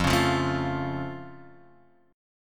F Augmented, Guitar, Open F; strummed; x,0,1,0,0,0; down

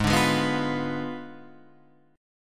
Ab Diminished 7th Ab Guitar Standard; Abdim7 strummed; JGuitar

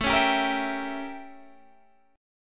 A 7th Flat 9th Guitar Standard; A7b9 strummed; JGuitar

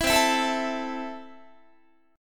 C 6th C down Guitar; strummed

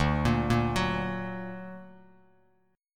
D Augmented D down Bass Standard; D+ arpeggiated; JGuitar

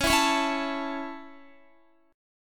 Db Minor Sharp 5th Db Guitar; strummed

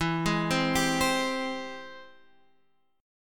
Ab Augmented Major 7th E, guitar

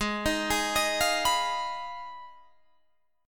G sharp Minor 6th G sharp down Guitar Open G

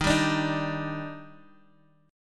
D sharp Major 7th, Guitar, Half Step Down

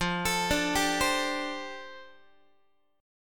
F 6th Add 9th F down Guitar, chord; F6add9 arpeggiated

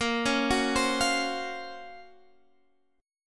Gb Major Sharp 11th Bb

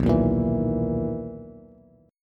B Major 11th B down Bass; BM11; strummed; JGuitar

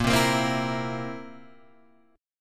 Bb Suspended 2nd Flat 5th, Guitar, Open G; 8,5,8,5,5,8

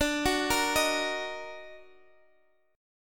Eb Minor Major 7th D

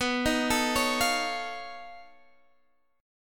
B 9th Flat 5th B down Guitar Drop D

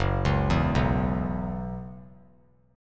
F sharp 7th Suspended 4th; F#7sus4 arpeggiated

